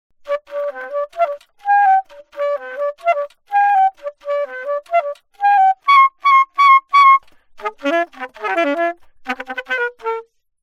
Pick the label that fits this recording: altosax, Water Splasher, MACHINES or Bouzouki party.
altosax